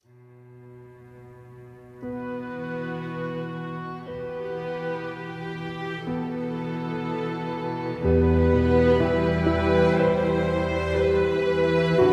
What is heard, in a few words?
Dramatic Cinematic